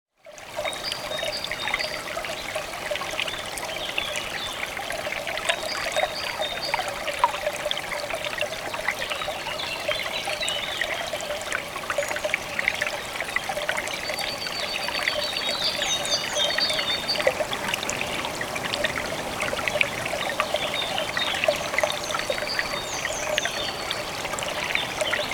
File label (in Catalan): Bosc de Ribera, Torrent; Esporles